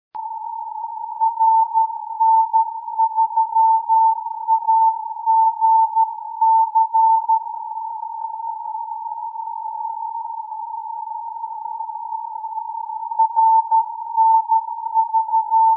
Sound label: CW AGC with filter